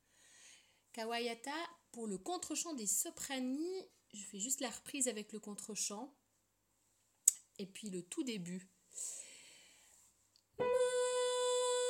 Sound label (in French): contrechant soprani